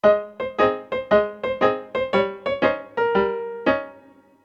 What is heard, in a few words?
staccato swing